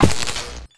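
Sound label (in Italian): Elettrico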